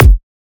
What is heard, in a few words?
Kick, Dubstep; Dark Matter